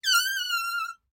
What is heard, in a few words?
Fart Whistle; SoundsCrate